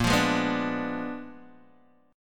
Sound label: Bb 7th Flat 9th Bb, Guitar Open D; 8,8,6,5,5,x